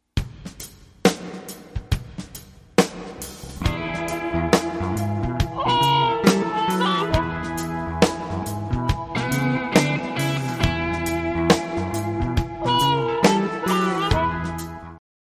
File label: Cool Blues